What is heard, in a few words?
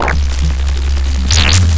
ACID NOISE; Big Beat Samples & More; AKAI